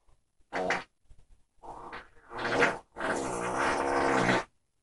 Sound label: basement short no voice